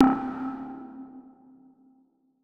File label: Bunker, melodic; SFX